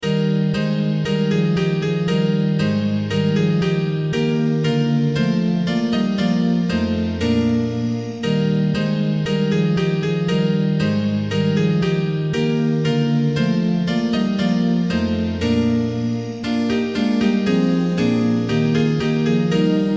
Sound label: instrumental; NoiteJubilosa